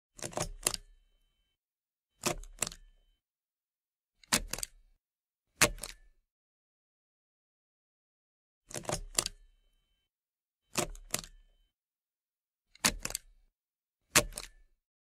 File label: staple